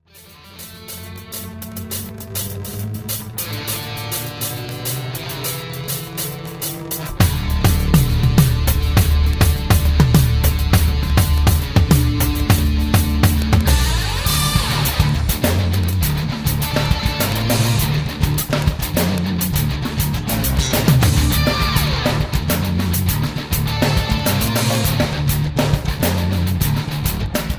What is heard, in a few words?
game music; Battlefield 2